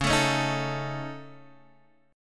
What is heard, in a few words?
C sharp Suspended 2nd Sharp 5th C sharp Guitar DADGAD, chord